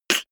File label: beatbox snare harsh damped